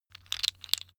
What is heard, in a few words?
tiles wood rattle; objekte; holz